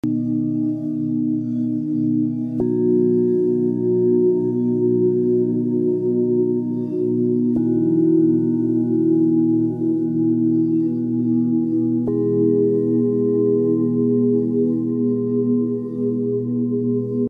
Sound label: Crystal bowl; کاسه کریستالی